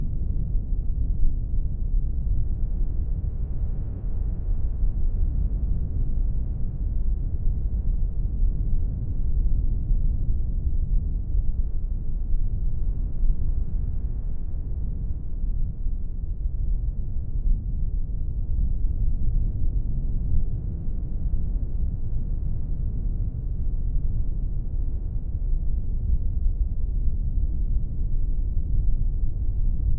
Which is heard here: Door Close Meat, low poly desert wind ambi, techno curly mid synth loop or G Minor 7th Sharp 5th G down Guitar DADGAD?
low poly desert wind ambi